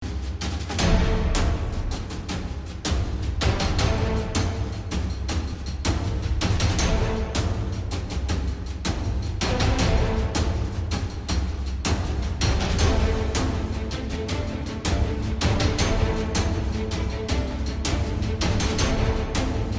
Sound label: cinematic, cinematic orchestra with epic drums; suno